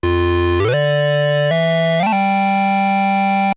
sting, Arcade

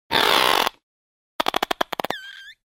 lizard happy; Animals

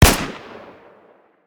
SVD, Guns Fire

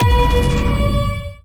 laser clown, Gunshots; Weapons

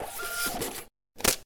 short print and rip, Machines

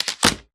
multitool drop; Items

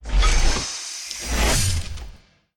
fuel injection; Machines

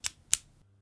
pen click

